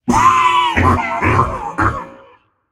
clear throat, voicepack; smite; jorm

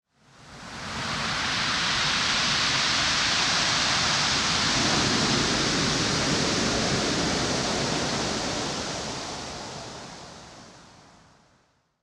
ambience ocean big wave; smite; conquest

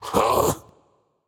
confused; smite; voicepack; odin

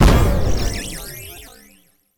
Explosion; smite; cupid; Fields Of Love

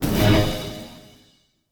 pwr sm fire; smite; god baronsamedi

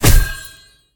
projectile hit; smite; tiamat

